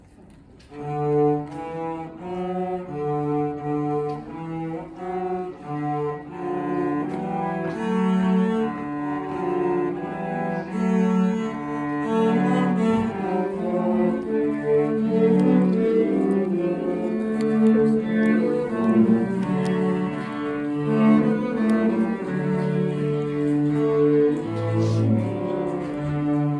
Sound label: Cello Performance